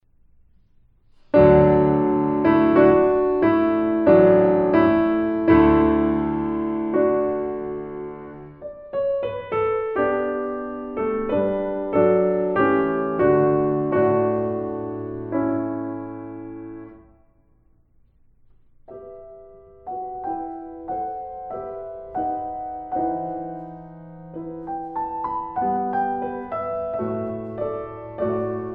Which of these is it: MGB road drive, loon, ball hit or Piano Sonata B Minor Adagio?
Piano Sonata B Minor Adagio